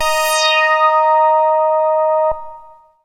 MASS LEAD, SYNTH; Keith Emerson Signature Series

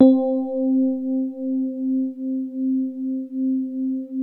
DX7 SOFT C4, E-PIANO; Music Store

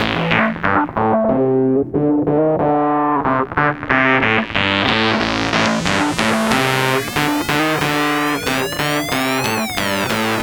E-Bass + Analog Synth

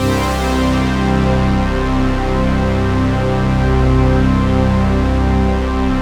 BIG OBI KORD, OBI CHORDS; Zero-G Ambient 1

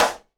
SIDE STICK, Live Snares

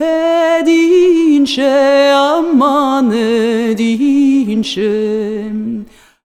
VOICES; USB Soundscan vol.63; AKAI

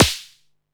snare, techno 138 BPM

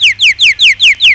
BIRD; CMI Sound Library Rev 1.3

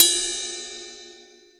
Roland R-5 Ride Bell Cymbal

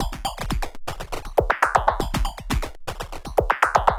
Anabeat Mix, Beats

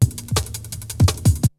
BREAKBEATS, TECHNO

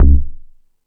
Hip Hop, Drum Machines

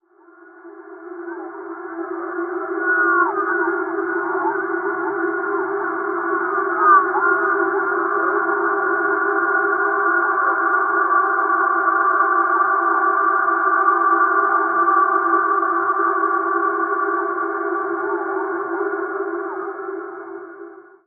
Deep Signals, Spacey; Zero-G Ambiosis

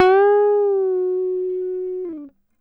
GTR TRIK, Jazzistic; Univers Sons

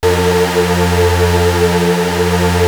Prophet 5 F3; Hollywood Edge - Giorgio Moroder Rare Synthesizer Collection

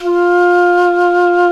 Jazz Flute

